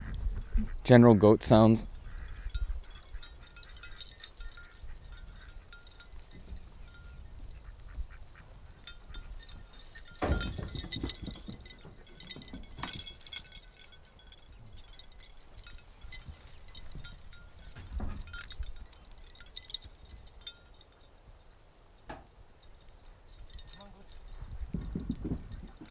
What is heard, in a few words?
GOATS